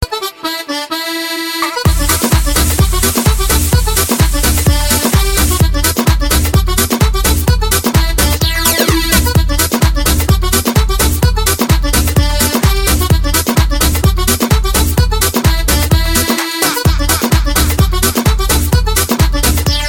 High Quality Club

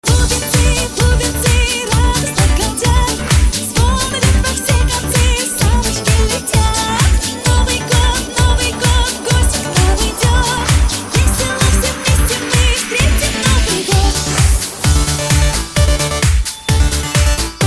Jingle

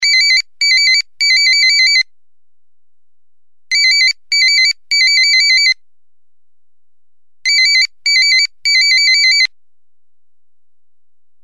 90s Ring

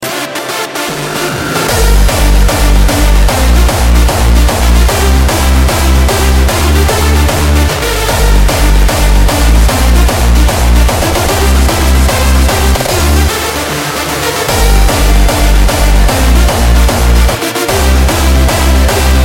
Hardstyle

